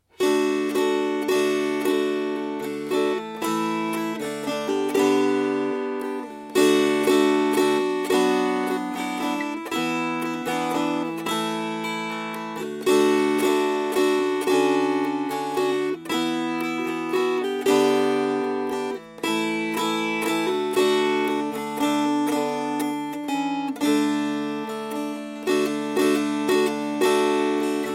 Strummed

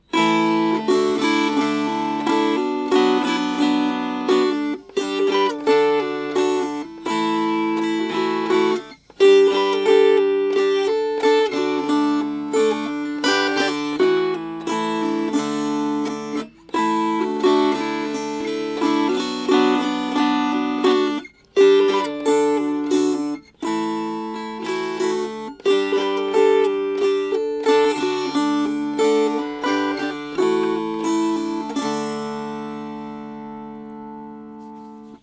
Strummed; My Passion Is As Mustard Strong

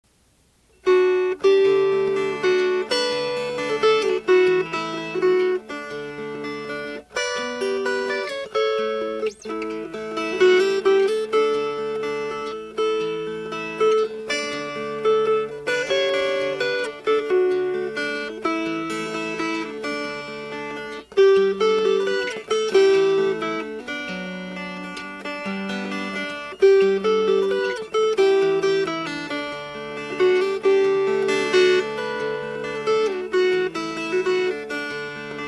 Unquiet Grave Fingerpicked

